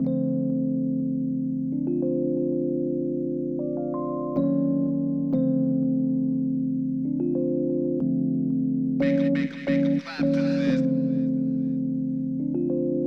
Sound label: Melody